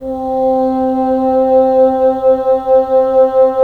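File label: wind bassoon; LA Composer vol. 3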